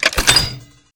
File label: reload eject, weapons